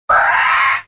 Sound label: velociraptor Call sfx